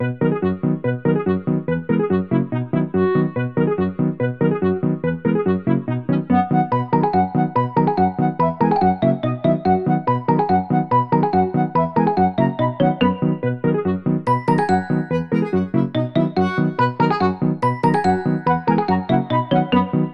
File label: Generic Minigame; peppa pig world adventures